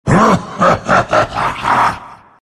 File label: laugh; Lifestealer